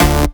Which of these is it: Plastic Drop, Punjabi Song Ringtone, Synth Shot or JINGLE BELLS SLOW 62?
Synth Shot